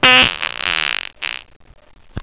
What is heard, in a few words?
fart